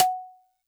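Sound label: Xylo Snare DX100 F sharp 4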